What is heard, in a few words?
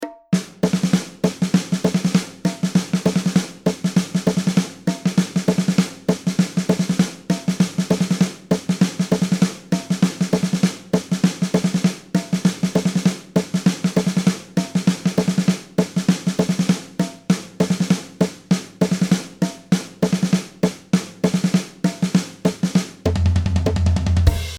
Intro, batteur